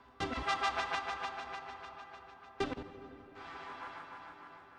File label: sequenced texture pad hit; instruments; loops